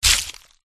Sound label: flower attack contact mid